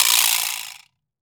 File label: vibra slap; AKAI S-Series CD-ROM Sound Library VOL-5